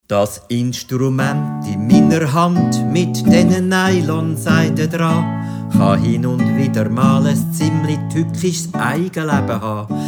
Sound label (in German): Gitarre